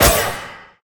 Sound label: burn start; smite; god daji skin15